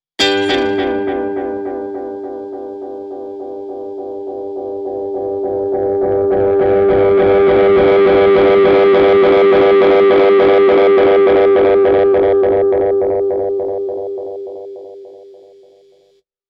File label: Binson osc